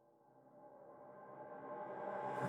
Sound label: Deep Hole Dive Blowing